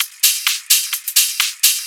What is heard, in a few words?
Hihat Loop 128 BPM